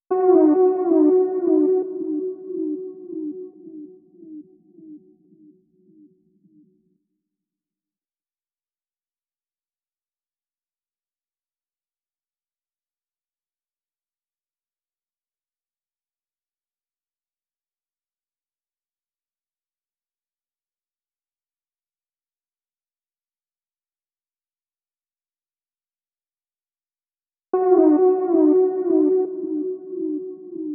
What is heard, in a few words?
70 BPM B Min Accent; Melody Loops; Bluebird; Cymatics; ANALOG EVOLUTION Beta; Lofi Collection